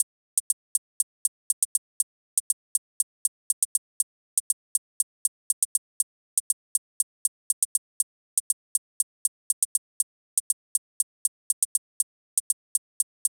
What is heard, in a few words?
77 chh; hydrogen